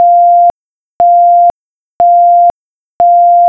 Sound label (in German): Besetzt Amt